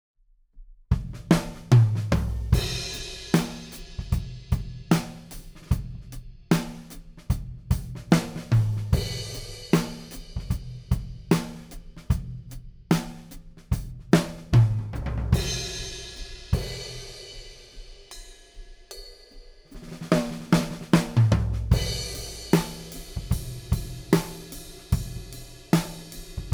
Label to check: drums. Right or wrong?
Right